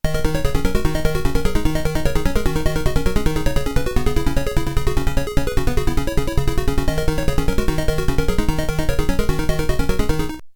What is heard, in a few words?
Warp Cave; Penguin Adventure OST; Audio FlashJacks